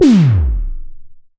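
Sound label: snare, EMS VCS3 Drums; Polyend; Simulacrum Sounds; 1 Shots; Synths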